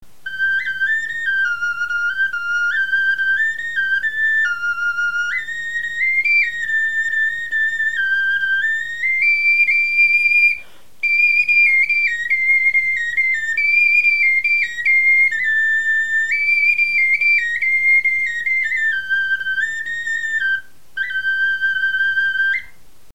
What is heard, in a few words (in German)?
melodie, knochenfloeten